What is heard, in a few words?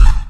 drop